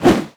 attack whoosh, zombie; creatures

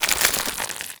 zombie move, foley; Half Life Model Pack

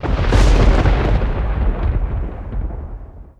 thunder; Counter Strike Model Pack; CS:GO

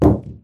metal grenade impact soft; physics

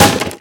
wood solid impact bullet

